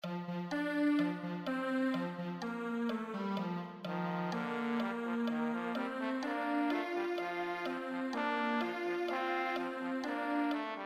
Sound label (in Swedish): Trombonduett 126 bpm; Rubank vol 1 duett 12